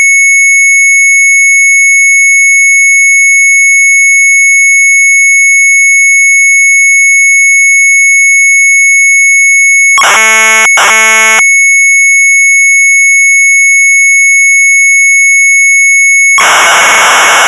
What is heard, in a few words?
Sharp MZ800 Tape; STRUISVOGEL; retromelsarchive